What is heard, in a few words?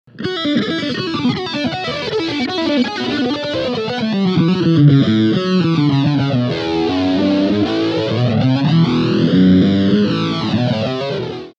GP16, guitar sounds; Kettner Red Box (the older MK I version)